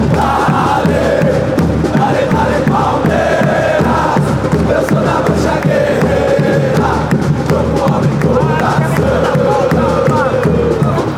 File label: fan chants; eu sou da mancha guerreira